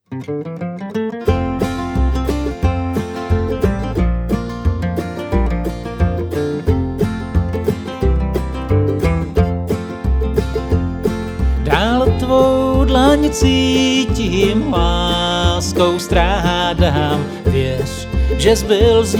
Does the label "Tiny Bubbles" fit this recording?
No